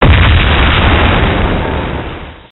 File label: big exp; hl2dm